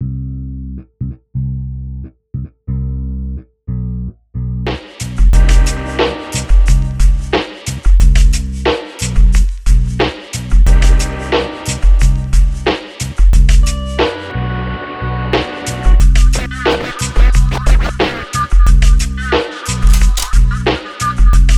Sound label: trip hop